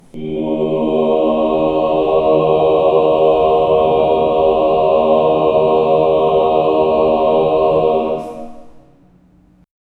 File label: real orchestral choir ahh